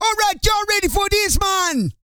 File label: REGGAE, Vocal Planet, World Voices; ILIO; Vocal Planet VOL-5